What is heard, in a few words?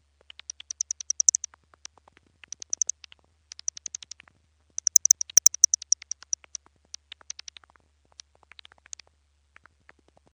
fd digital compander